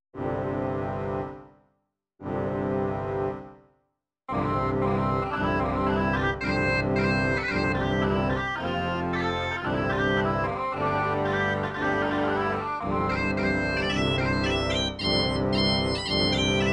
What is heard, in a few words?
folk bagpipes, midi